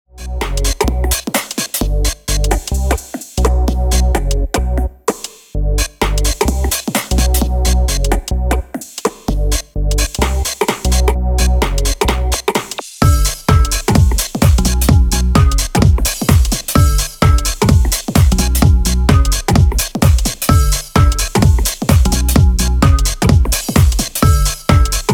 Repeat Instrumental

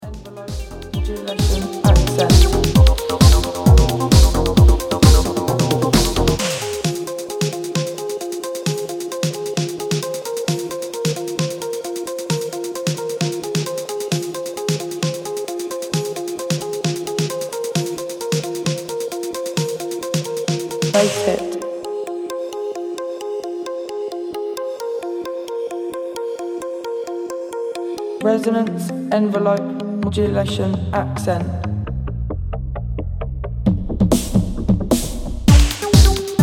Modulation Accent

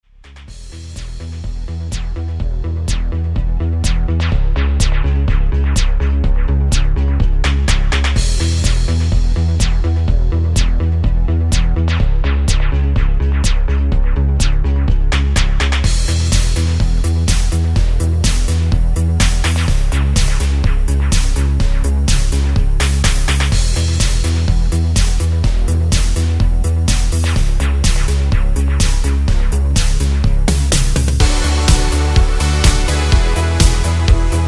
Instrumental remix 125 bpm

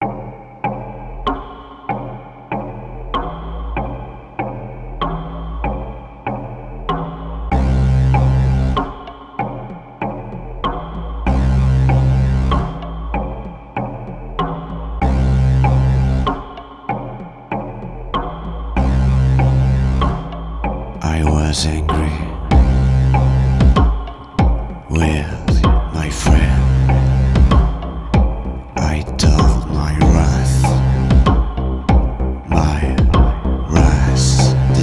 Techno Thriller

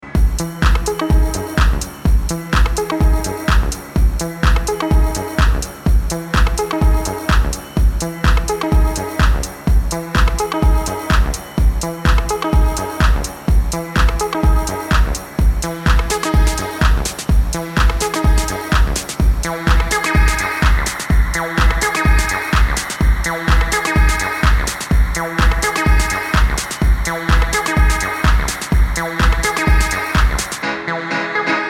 Piano Dub

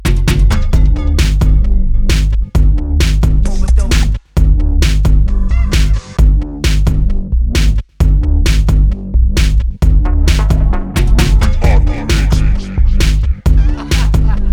Instrumental

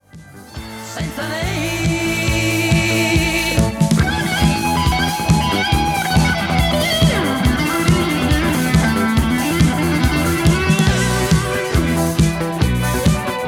drums records

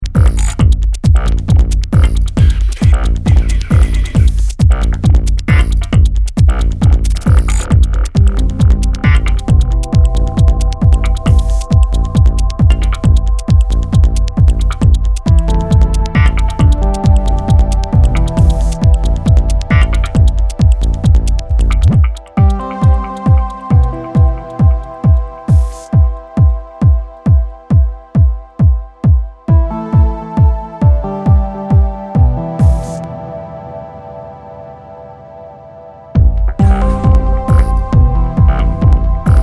BELL

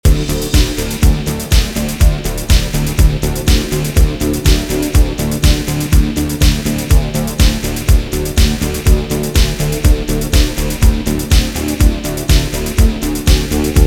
Maybe Instrumental

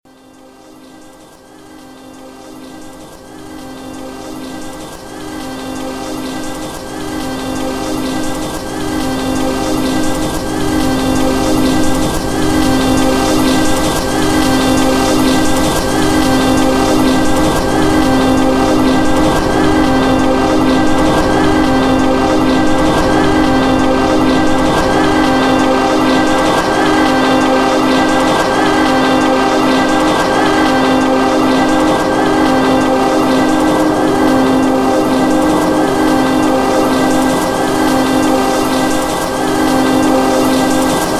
Locked Groove

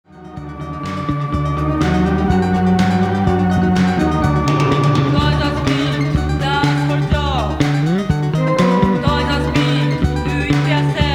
Neo Museum Ethno Music

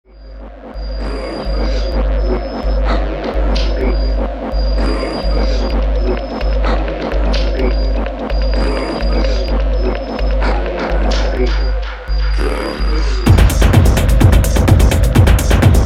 Hundred Drums

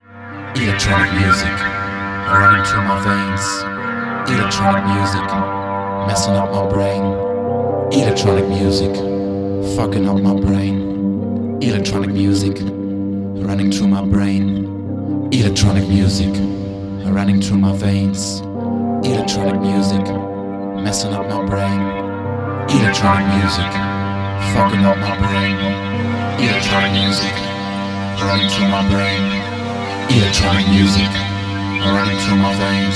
Electronic Ambience

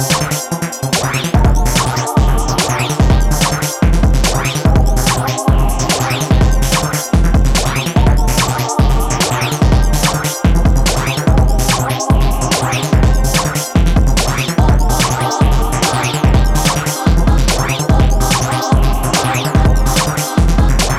Trance

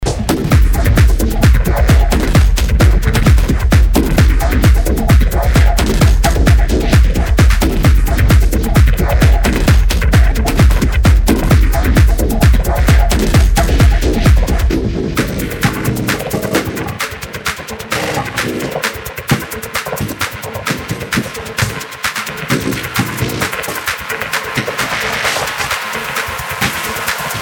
Radical Jazz

